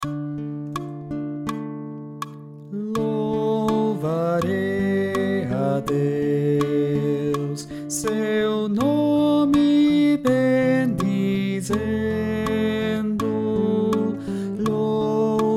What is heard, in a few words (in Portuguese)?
soprano oitavado